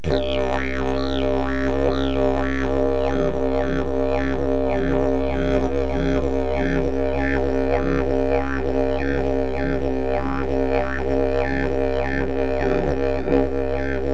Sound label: jilm party, didgeridoo